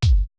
kick, Roland MC-909

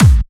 Clubby Kicks, Bassdrums

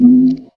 DD SYN, Disco House

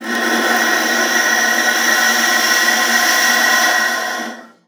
VIOLA FINGER; Xsample VOL-5

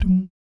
BS DUM, Vocal Planet Jazz & FX; ILIO; BASS DUMMS

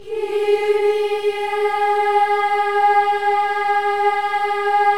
KYRIE, Symphony of Voices; Spectrasonics; BC KYRIE

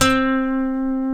D, funk guitars; Spectrasonics; Hans Zimmer Guitars VOL-1; TAKA FUNK

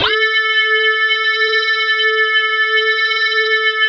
ROLAND JX8P WAV A5; Big Fish Audio; First Call Vintage Keyboards

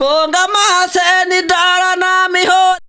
VOCAL LINES; Best Service; World Colours

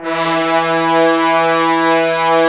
BRS, Roland LA Composer; 90 s Sample CDs; Tbns

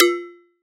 FX JUG BND; ModelCycles

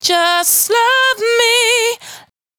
92bpm Bb, Velvet Vocal; Zero-G

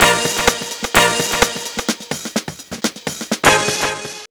127 sound 1 L, Fresh Disco House

